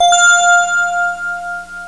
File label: ding sound